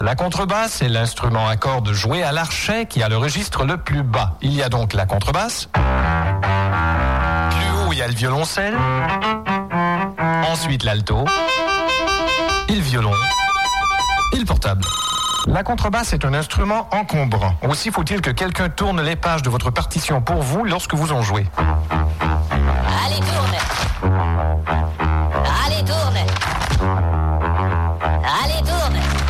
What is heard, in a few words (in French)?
Musique la contrebasse; Les 2 minutes du peuple